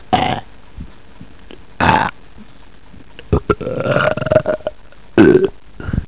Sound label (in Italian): A puntate, Rutti